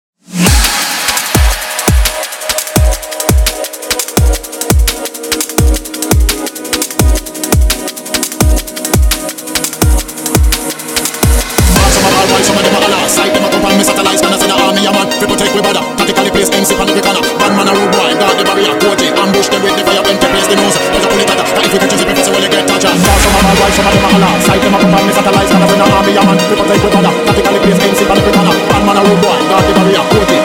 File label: Ragga, Drum mix